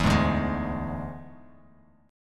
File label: D Suspended 2nd Flat 5th D Bass Standard; 12,11,0,13; strummed